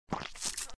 Suck; Lewd SFX

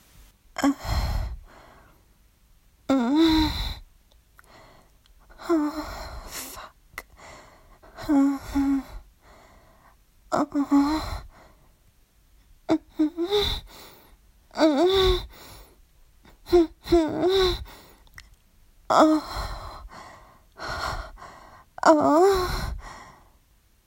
Moaning sample

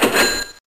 Cha Ching; Lewd SFX

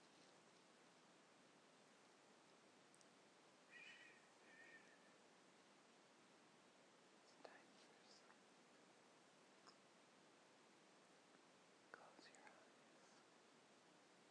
Lullaby

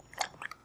Suck

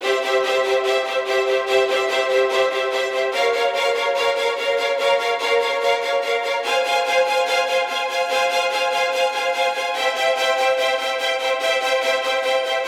strings, 145BPM

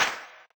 Sex Smack

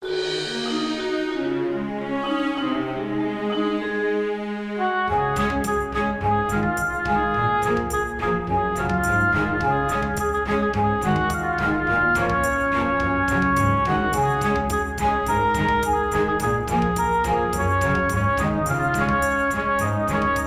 Orchestral Cover

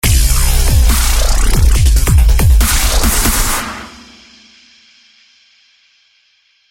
Wubs

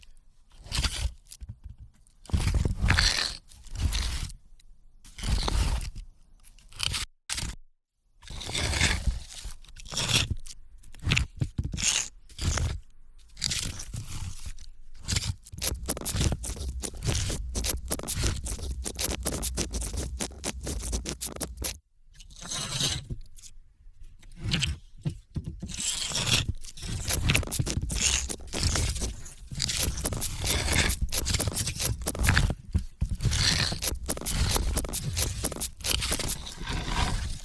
scraping stones, rocks

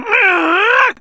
Grunt; Lewd SFX

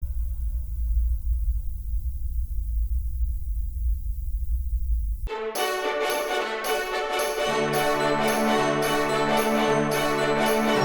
Orchestral Instrumental

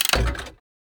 Crank Vending Machine; Lewd SFX

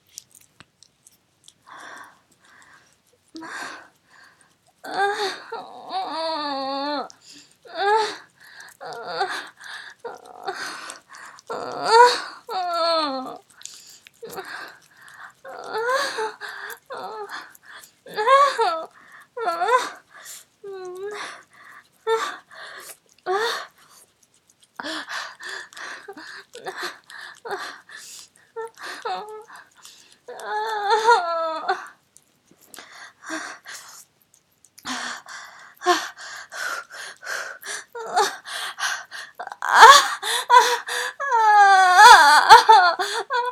Wet Sounds, Whimpers, Heavy Breath, No Words; An indulgent minute

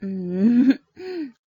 Moan

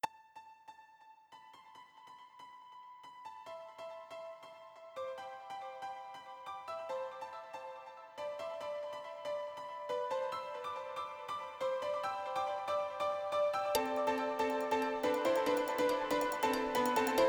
Hardbell

